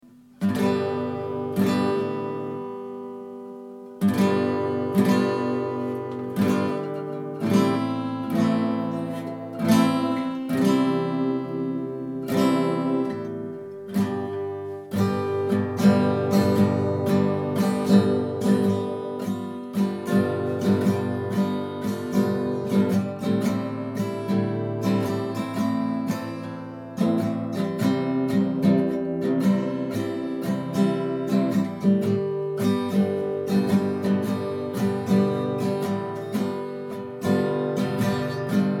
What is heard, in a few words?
Guitar Loop